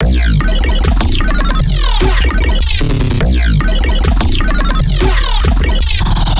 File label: Bass Loop, Dubstep, 150bpm; X Saturn; Ghosthack; Dubstep Sound Arsenal